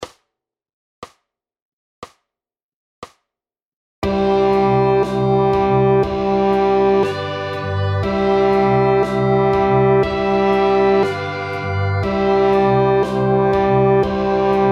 first song horn

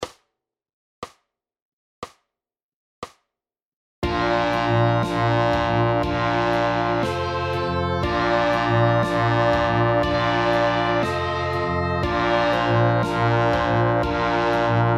first song posaune

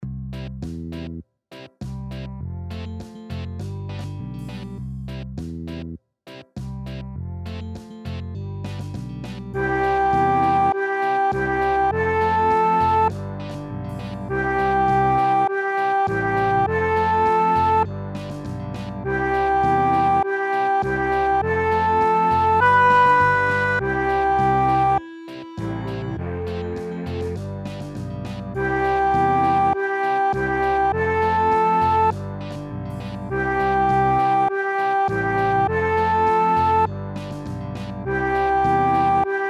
reaggy fuer floete

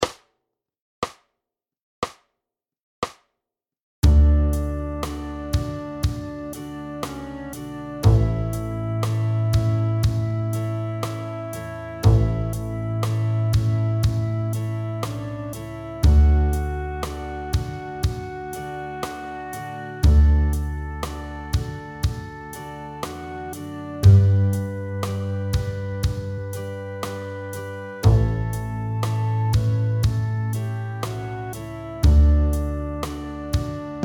drei chinesen kontrabass

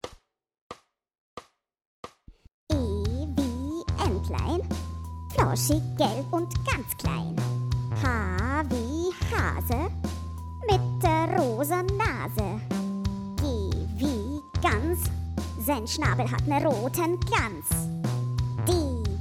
Saiten Rap

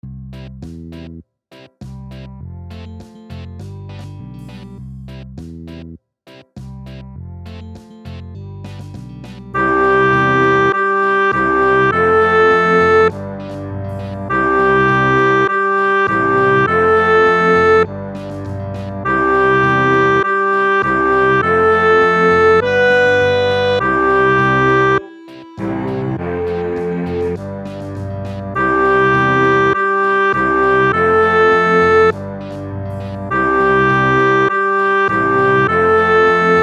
reaggy fuer klarinette

